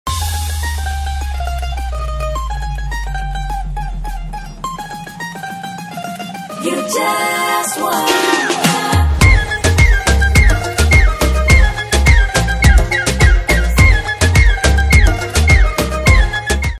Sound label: BGM Ringtone; Bala Movie